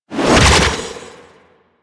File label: Archwing Prime Sword Short Whoosh; Warframe; Weapons